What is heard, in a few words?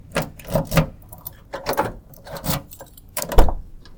opening a car door